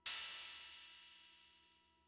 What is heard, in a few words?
Cymbal; Fricke MFB-522